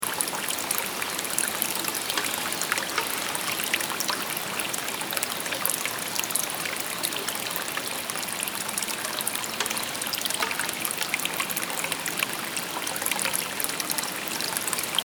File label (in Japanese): せせらぎ音とししおどしループ